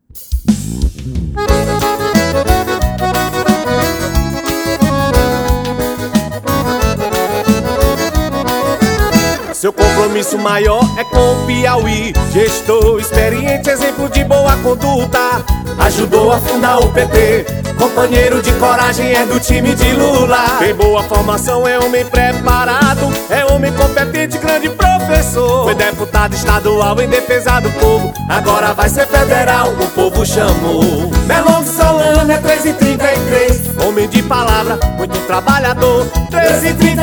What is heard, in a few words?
Jingle Oficial; Merlong